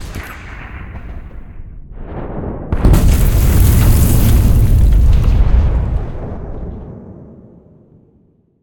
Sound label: explosion whoosh, Designed Explosions; Bluezone Corporation